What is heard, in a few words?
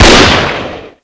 colt fire; svencoop; scshogo